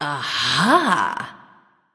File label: discover; smite; arachne